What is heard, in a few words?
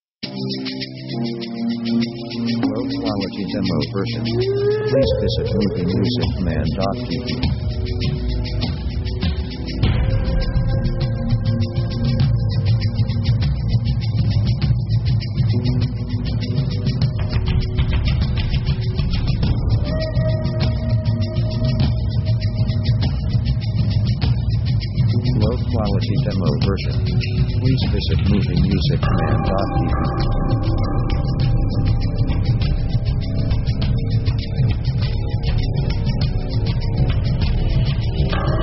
synth sound design; synth themes